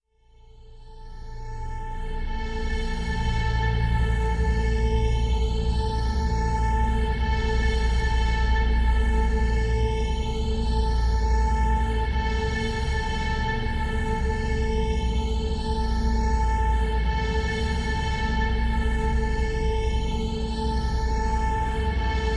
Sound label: Drones